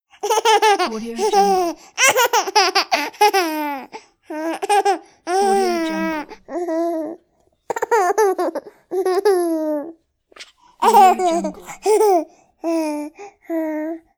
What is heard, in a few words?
baby laugh